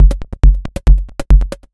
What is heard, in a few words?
percussion loops, Techno; PS-45